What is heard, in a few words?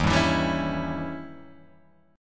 D sharp 7th Flat 9th, D sharp, down, Guitar, Open G